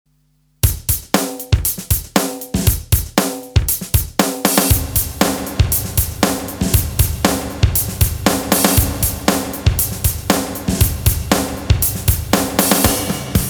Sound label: Eventide H3000 Room Drums; Bobs